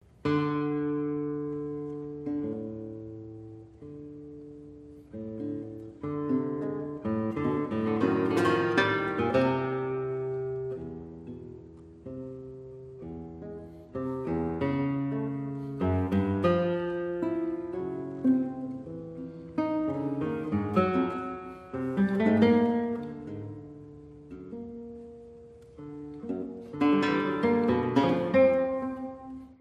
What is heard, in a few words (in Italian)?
espressivo